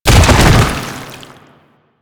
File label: Proto Infested Bodyfall Default; Enemies; Warframe